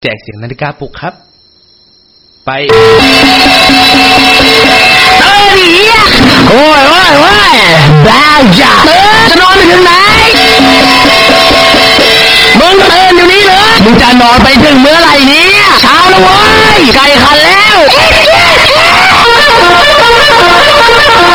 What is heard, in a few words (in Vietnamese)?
am thanh dong ho bao thuc; chekung viral tiktok